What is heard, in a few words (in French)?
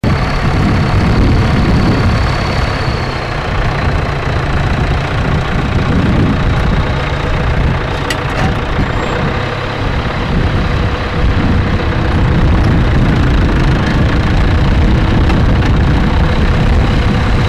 machinerie agricole